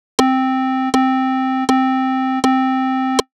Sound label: Rhythm Note